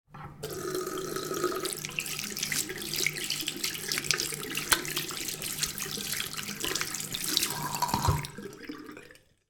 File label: household sink tap on wash hands off gargle; sound effects one